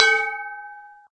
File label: chime